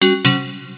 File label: wrong answer